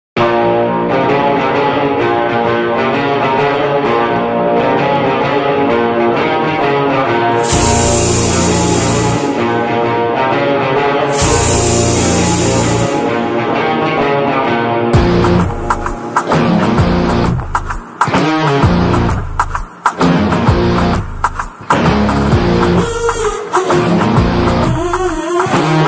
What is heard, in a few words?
instrumental ringtone mass bgm; thalapathy vijay